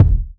sharp urban bass drum single shot c sharp key

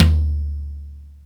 acoustic tom drum d key

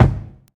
short reverb kick drum sound c sharp key

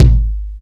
wet bass drum sample g sharp key; kick drum samples

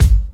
thumpy rap kick drum sample g sharp key

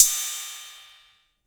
drum ride sound f sharp key

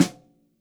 tight lows snare drum sample a sharp key; Duration 0:01

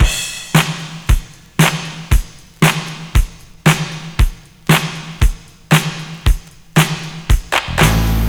116 bpm drum loop sample d key

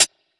long room reverb pedal hi hat sample A sharp

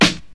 good snare b key

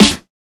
wet acoustic snare g sharp key; Mono

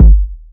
kick drum sample g key

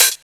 Dampened Hi-Hat Sound C Key; Tuned drums

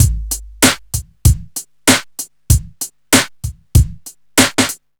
96 bpm drum beat A key; drum loop

